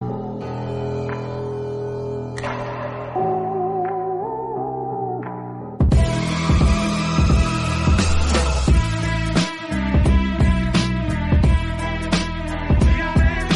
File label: musical background tone